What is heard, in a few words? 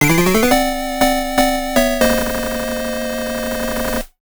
Life Lost (8-Bit Soundtrack)